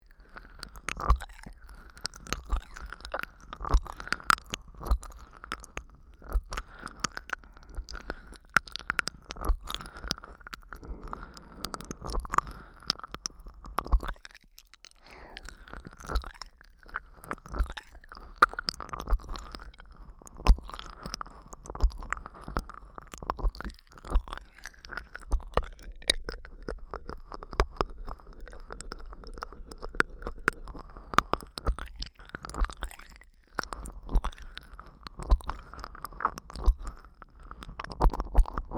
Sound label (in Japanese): 耳舐め音; ASMR